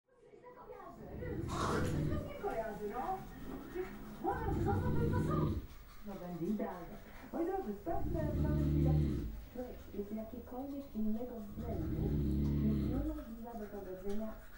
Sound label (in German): schnarche fernseher